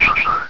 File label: Rapid Spin; battle anims; PokeRogue